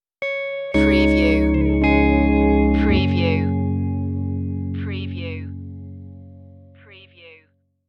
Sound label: stirring spirits stinger